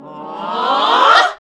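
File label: classical singing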